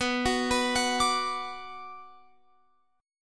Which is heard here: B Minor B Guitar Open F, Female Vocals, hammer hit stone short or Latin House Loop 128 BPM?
B Minor B Guitar Open F